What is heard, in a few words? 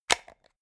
plastic remote battery compartment open heavy